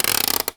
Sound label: RATCHET